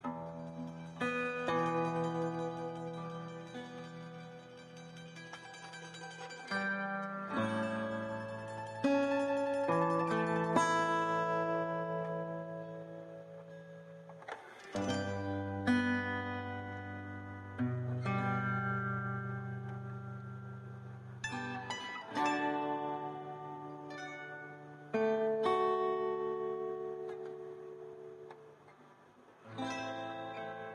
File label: Loop Neutral